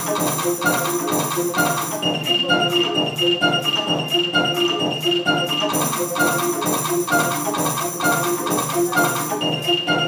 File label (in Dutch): prachtig moderne decap sound